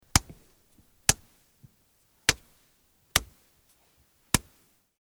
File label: body hit